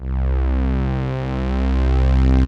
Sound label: Swell, FX Effect Sounds